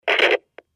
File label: Telephone hang - up, in-line; Futz Movement